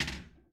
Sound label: Pinball Ball Drop